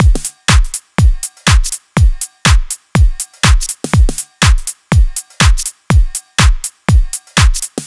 122 Full Drums

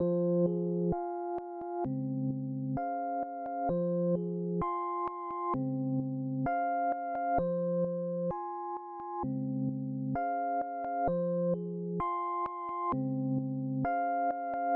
rhodes

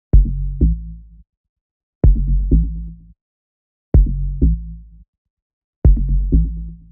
bass C 126; ronny

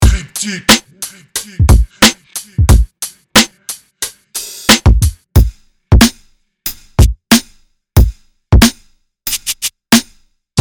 drum kit old school

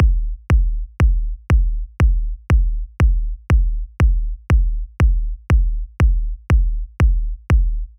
Kick Loops 120

